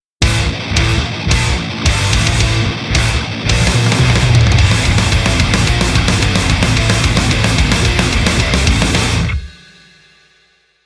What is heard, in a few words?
round mvp anthem